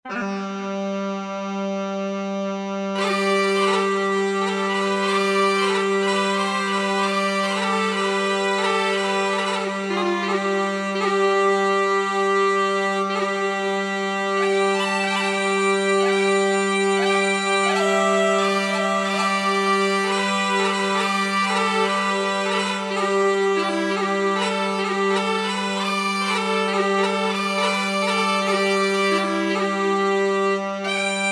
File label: Hill & Sheeps